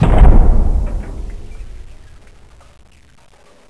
plat stop

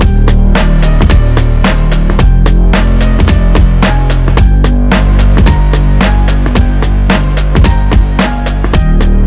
piano tune

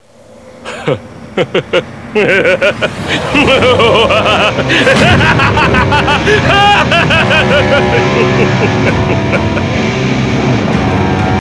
crazy laugh

